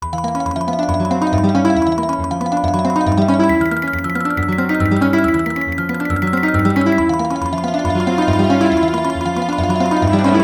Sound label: Time Out Warning; Ghouls 'n Ghosts; X68000